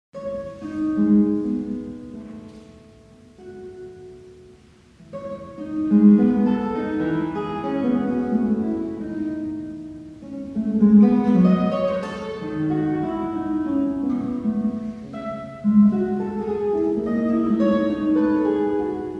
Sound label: Guitar